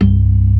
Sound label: CLASSIC C3, Synth and Organ